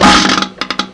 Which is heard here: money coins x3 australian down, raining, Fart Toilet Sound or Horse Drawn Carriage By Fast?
Fart Toilet Sound